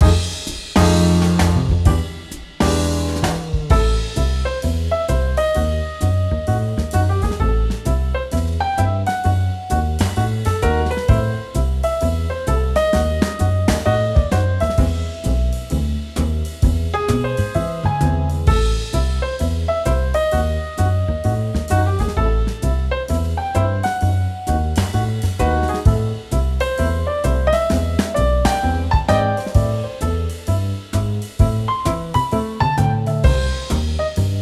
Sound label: BGM EVE TENSION; Girls RPG Cinderellife; 3DS